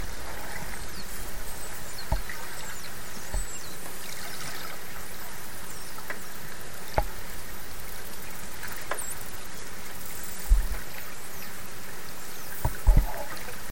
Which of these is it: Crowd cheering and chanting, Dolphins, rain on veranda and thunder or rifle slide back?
Dolphins